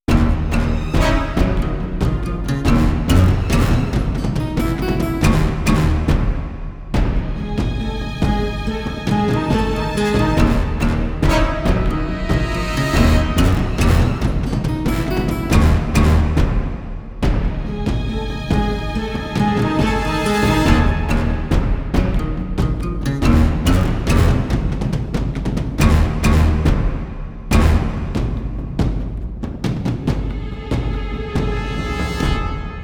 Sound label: Dark Tension